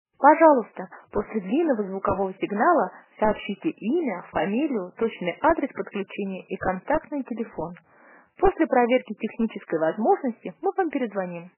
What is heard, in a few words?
ivr sonic